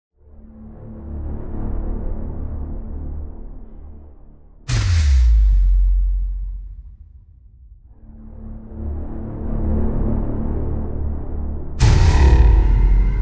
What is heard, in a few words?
Risers Expander; Gothic Storm Music; Advanced Sound Design 2012